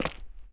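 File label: gritty cement run, footsteps; chief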